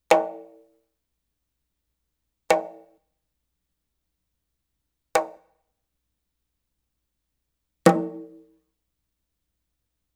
Arabic Rhythms

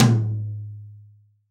TOM, PIN STP TOM

